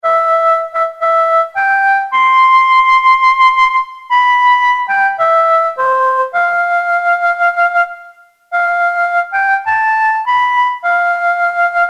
ringtone flute

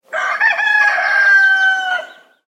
cockadoodledoo rooster ringtone

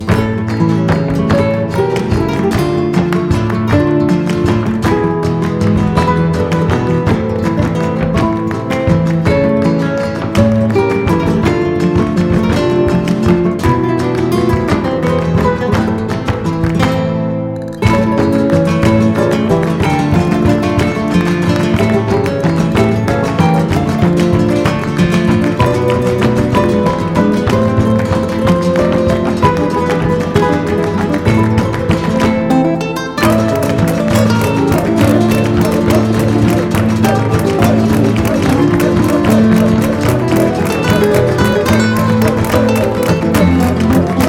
flamenco ringtone

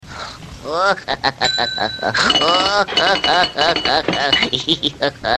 laugh; popeye

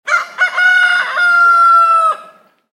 rooster ringtone

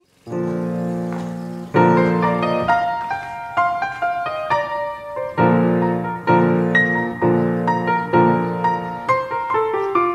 piano ringtone

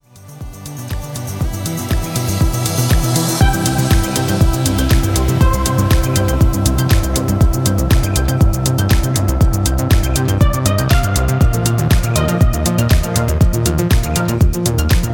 a rising wave ringtone

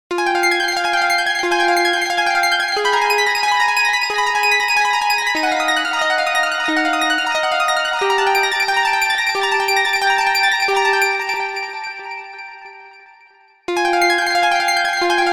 laser harp instrumental ringtone

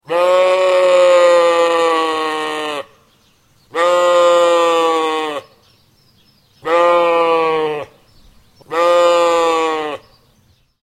screaming goat ringtone

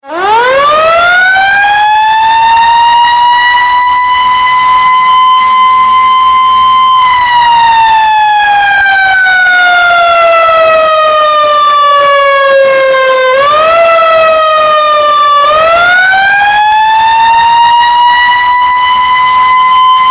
federal q siren

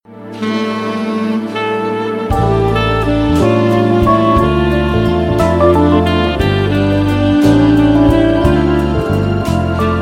love melody ringtone

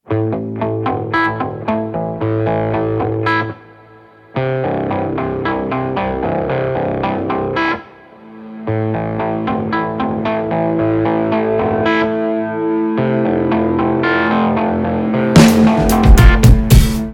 game over ringtone

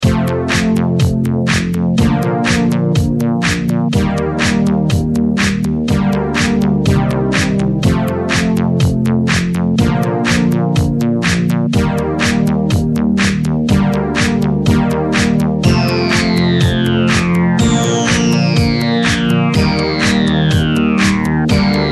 80s music ringtone

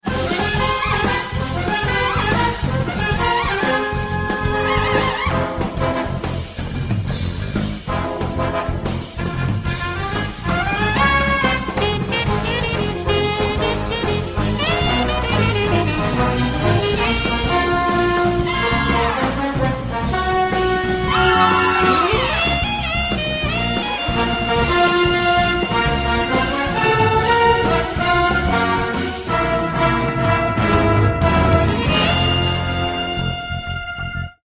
trailer music ringtone; the incredibles 2

